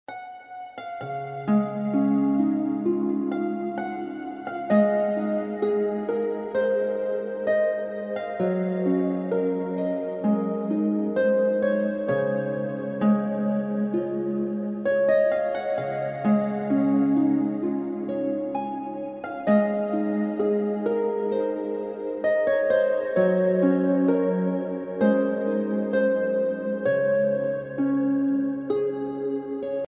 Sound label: Happy Relaxing Harp, Relaxing Music; heavenly harp music